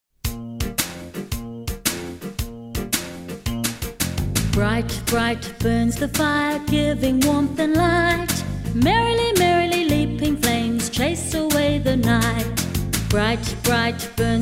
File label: Holy Spirit Song; Pentecost